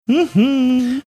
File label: sing songy mmm hmm